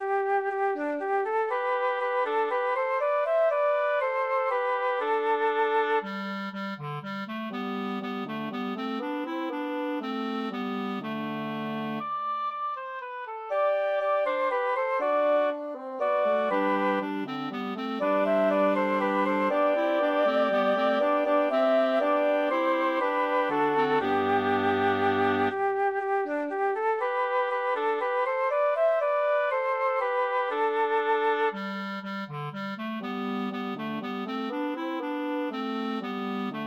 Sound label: for woodwind quartet